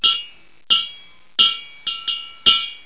CHISEL; TOYOTA

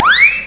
whistle; Casino; Gambling; Bahtzee 2.0

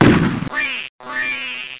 dead duck

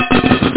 payout; lasvegas

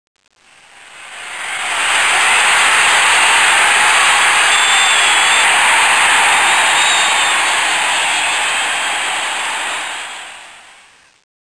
cheer

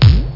e-bassdrum

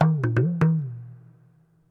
PERC LOOP ETHNO 125; eJay

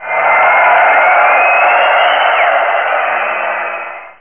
crowd

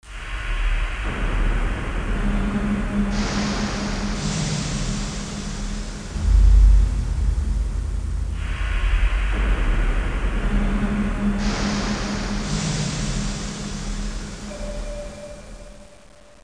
echoness; cyber

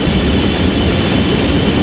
MAGMA

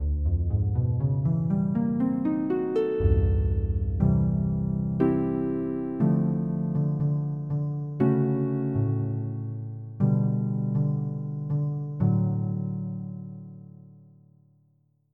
Orchestral Harp; FF7

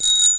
BIKE BELL

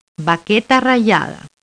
Sound Hat

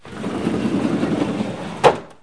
PATIO, OPEN CLOS; HOUSE

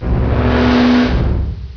machinery; environ; Gunlok

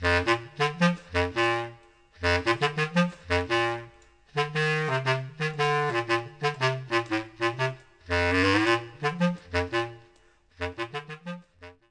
BASS CLAR

